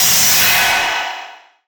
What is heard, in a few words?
Static; Blastwave